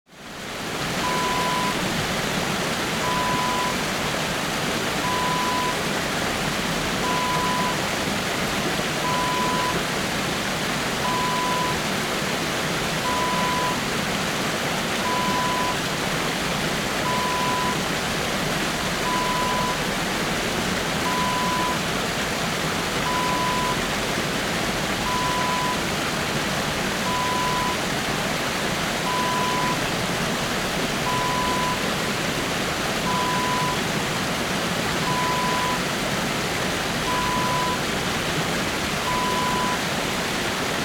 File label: waterfall